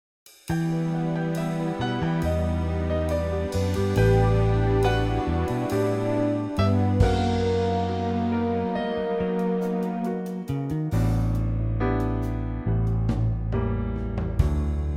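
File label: orchestra; key - D to Eb - vocal range - A to F; Superb orchestral arrangement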